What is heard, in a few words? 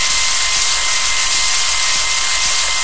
binoculars gyro, weapons